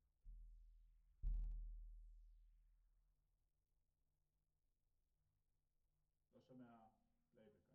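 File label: Snare Bottom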